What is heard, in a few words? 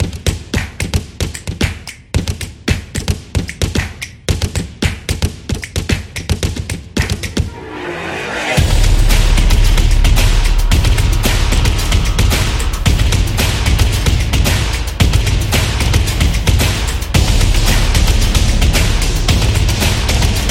Rhythmical; crossfit